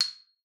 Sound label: C7, xylophone; Xsample VOL-4